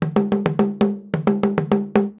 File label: Joy, happymusic; 特酷声音素材库; 背景音乐